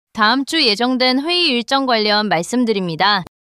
neutral long neutral

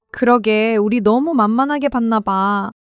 laughter; monologue

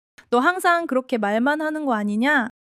laughter; monologue

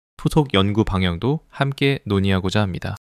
neutral long neutral

